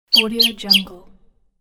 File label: jolly sparrow chirp